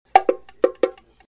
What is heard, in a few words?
Bongo Loop